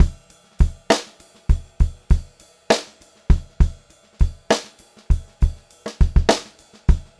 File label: Pop-Rock Drumloops, 100; USB Soundscan vol.37; AKAI; 90 s Sample CDs